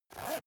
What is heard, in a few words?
zipper use short quick